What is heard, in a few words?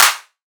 clap